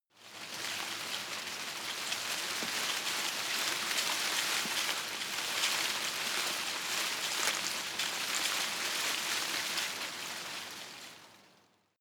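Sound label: tree small leaves rustle soft, garten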